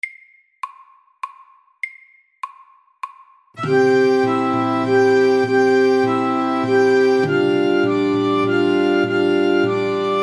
Irsk melodi SLOW 100

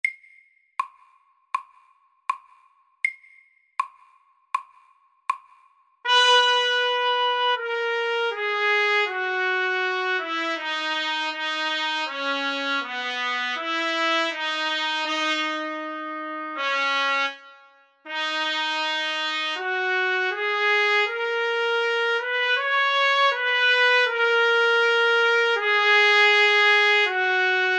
Etyde i Dess dur SAKTE

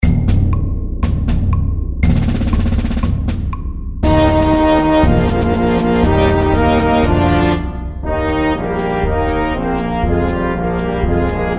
Fanfare og marsj FORT

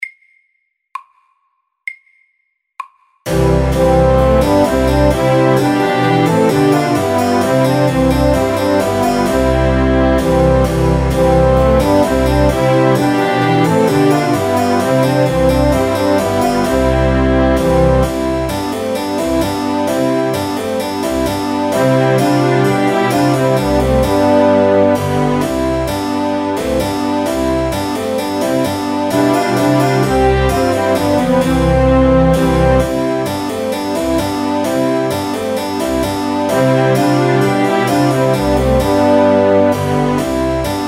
Trumpet tune FORT

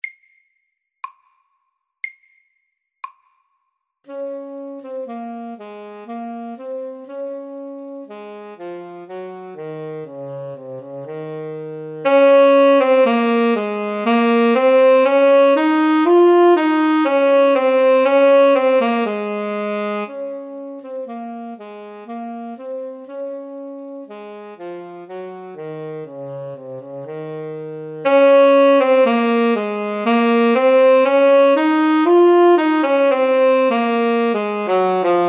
Dynamikk etyde SAKTE

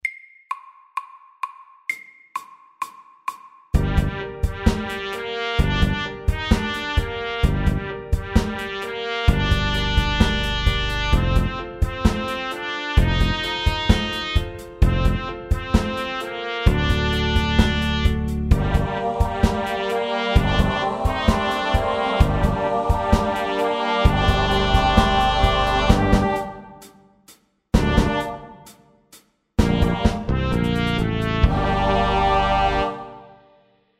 Minor rap fort

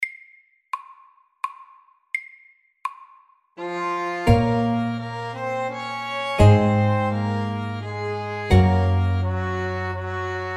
Folketone MIDDELS

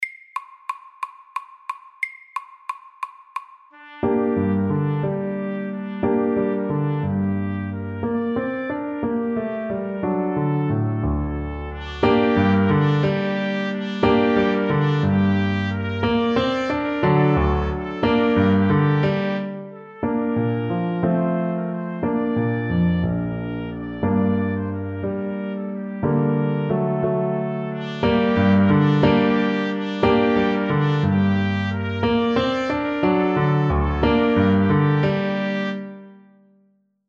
Bugle horn FORT

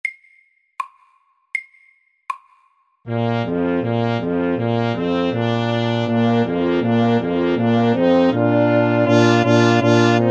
Fanfare FORT

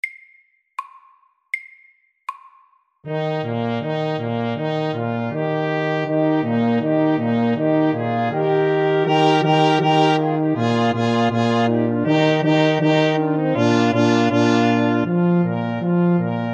Fanfare MIDDELS

